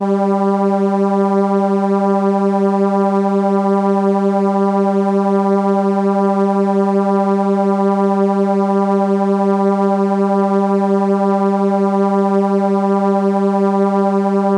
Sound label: violin G3, ARP Solina